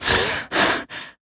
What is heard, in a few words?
voc gasp female; oblivion; Realistic Fatigue